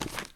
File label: pick up book